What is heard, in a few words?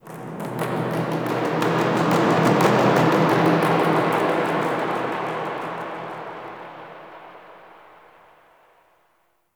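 Amphitheatre Echoes, Ambience; Zariman; Warframe; TheNewWar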